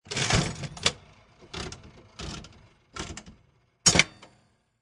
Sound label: Slot Machine Handle Pull And Wheels; Casino, gambling, Slot Machine. Pull handle, wheels spin and stop; Slot Machine Sound Effects